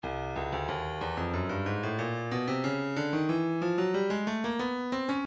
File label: breakbeat 092 bpm; progresso b; Stylus Breakbeat Midi Files